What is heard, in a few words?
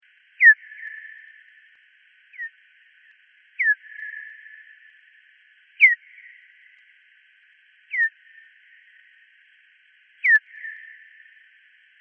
Hawaiian Hoary Bat